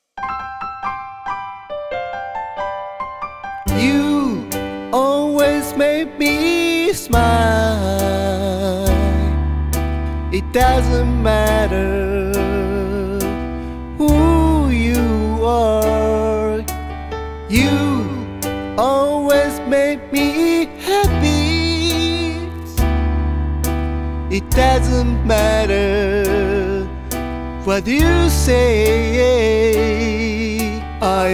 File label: Ballad version; LYC 2024 YOU